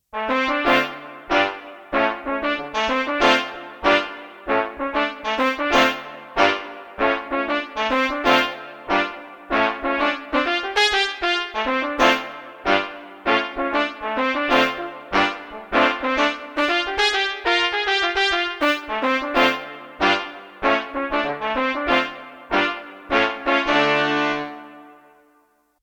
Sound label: Emax Kurzweil Brass